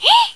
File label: huuh; Smile!